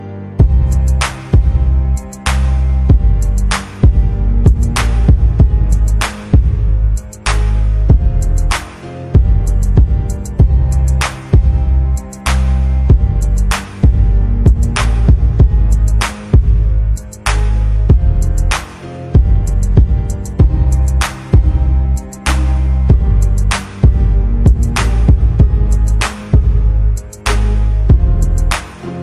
Bass

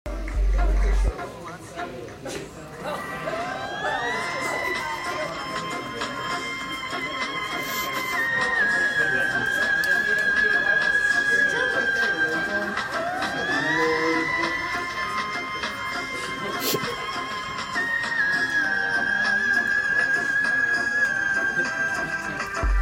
High note

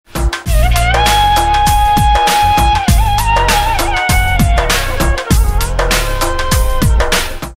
Fluit

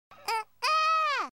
"Uhuu"

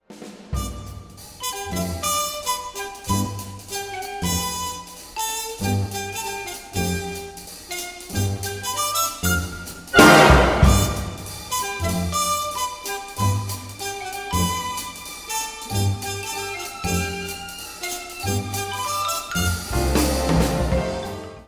Swing; Chocobo

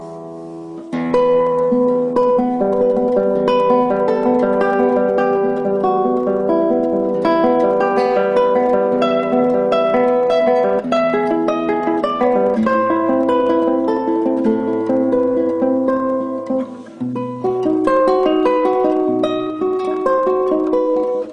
Spanish Romance

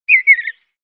Bird Sms

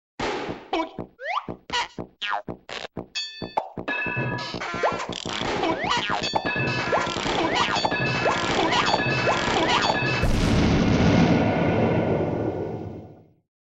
Boom

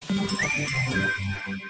jingle tv; gojek

